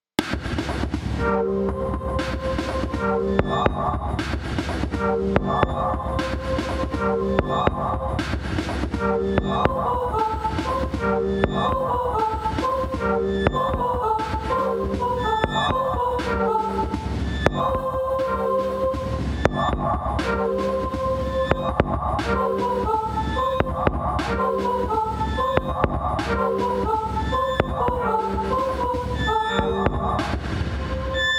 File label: MIDI